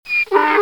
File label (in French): Ane, Animaux; bruitage